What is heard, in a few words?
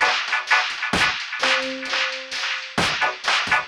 Octave Down Loop 130BPM, DrumLoop Ghost Layers